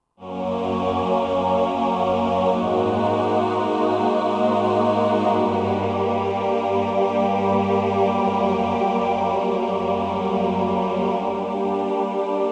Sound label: Real Choir, Roland SR-JV80-13 Vocal Collection